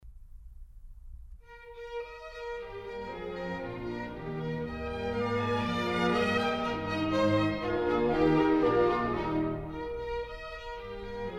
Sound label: Contredanses, Eb Major; Mozart; Dances and Marches